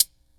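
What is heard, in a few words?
closed hi hat, univox; classic machines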